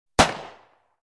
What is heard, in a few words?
Winchester 12 single shot